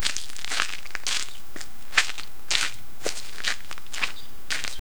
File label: crunchy fleshy foot steps